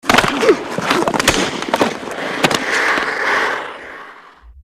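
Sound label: Hockey Player Fight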